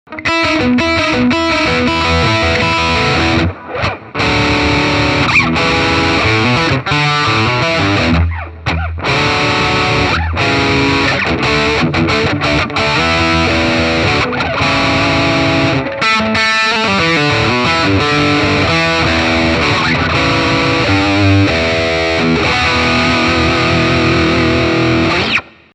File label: Joyo Preamp House